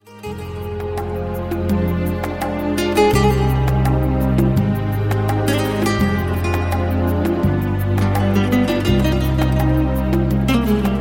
spanish guitar ringtone

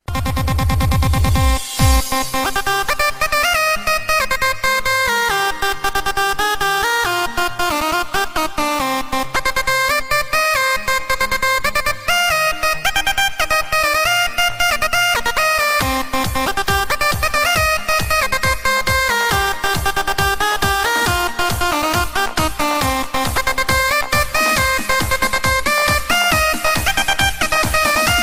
club rock ringtone; nokia

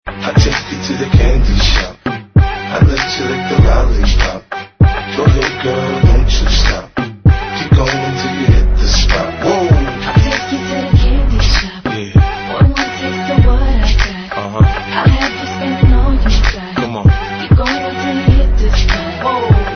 english song tone ringtone

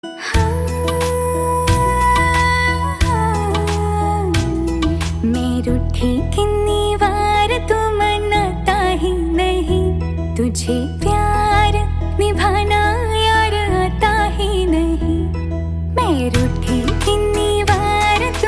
romantic hindi song tone ringtone; bollywood

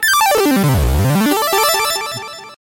hat chat sound hit ringtone

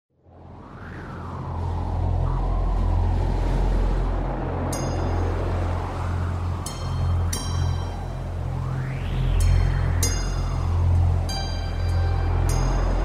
cool breeze ringtone

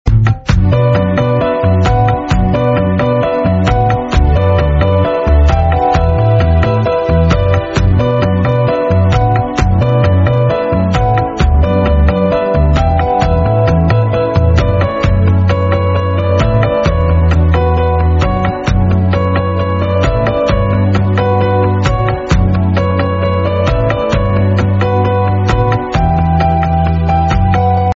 instruments ringtone

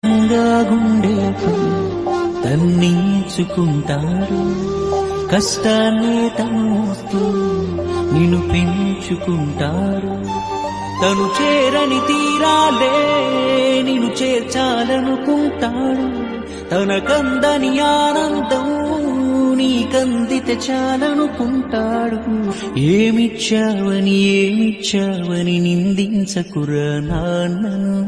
telugu love songs ringtone

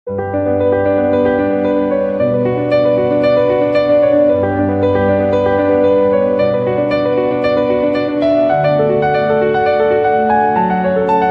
piano sound ringtone

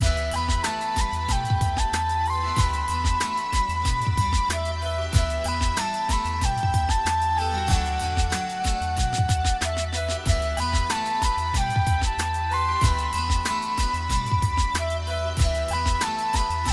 instrumental ringtone

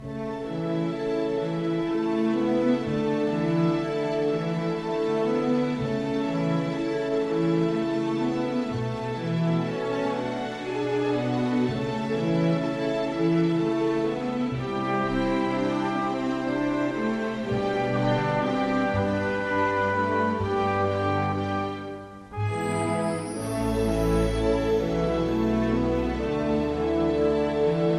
light music call notification tone

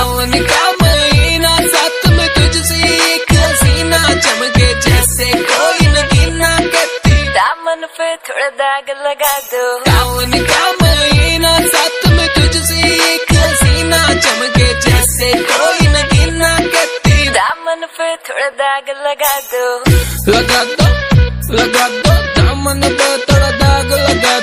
romantic english ringtone

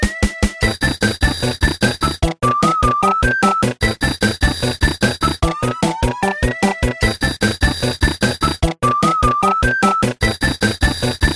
nokia ringtone; escape

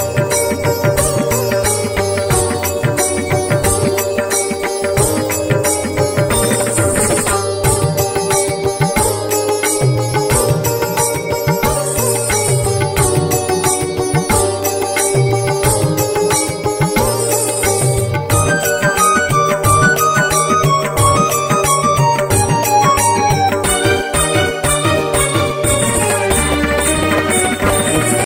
telugu song ringtone

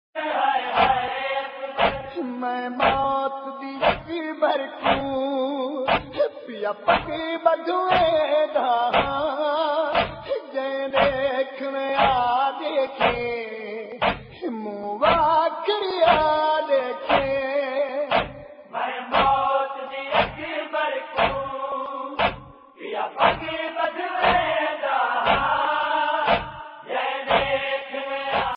noha ringtone; hae akber

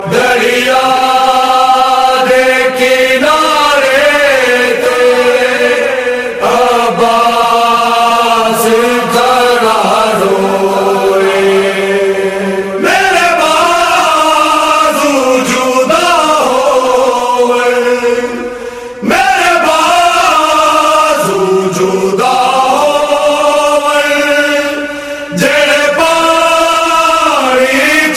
noha tune ringtone

